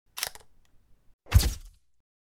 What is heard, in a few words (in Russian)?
zvuk vspyshki fotoapparata